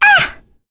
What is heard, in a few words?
Vox Damage; Lewsia; kings raid; en